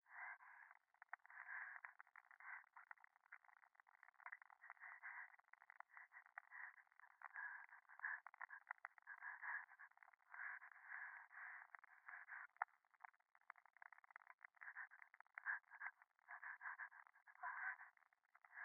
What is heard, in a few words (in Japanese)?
電話 右耳快感吐息 暖炉